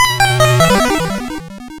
Fragment Grab; curse crackers for whom the belle toils switch windows gamerip 2022

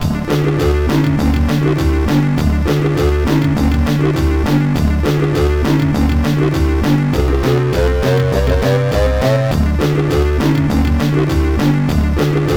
Cheerful Koumori (Fire Planet Bgm); Top Hunter: Roddy & Cathy; SNK; Neo Geo

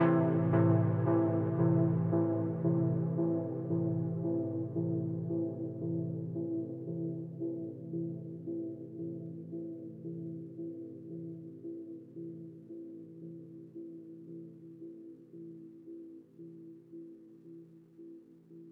Overlay Tone; Mirror's Edge Catalyst

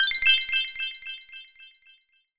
Puzzle clear; Piczle Cross Adventure

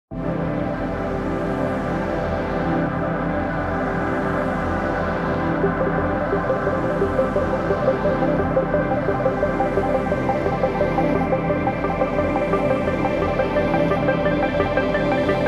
Music Elevator; Deadcore

